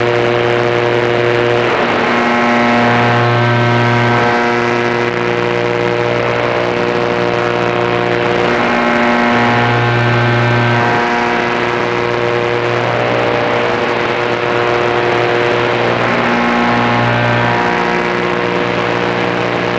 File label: 10MHz tune